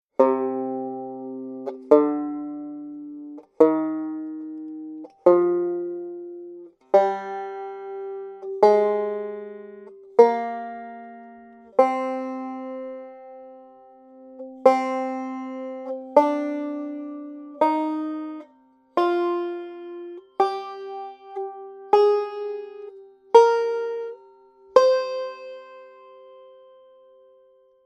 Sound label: C Minor Scale